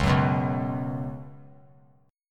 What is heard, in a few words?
Db 7th Suspended 4th Db down; JGuitar